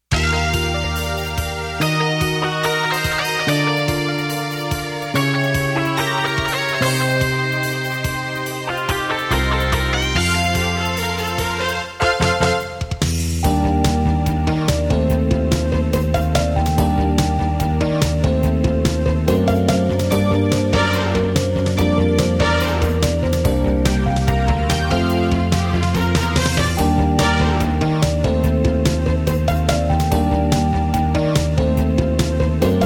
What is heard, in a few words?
Instrumental